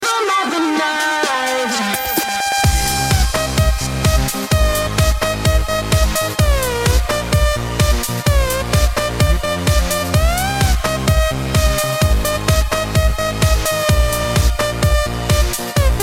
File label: Rythm